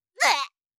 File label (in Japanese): ぐぇ！(ダメージ); バトル系